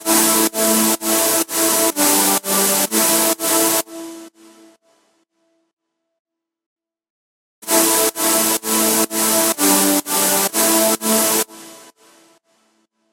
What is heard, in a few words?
Serum Pad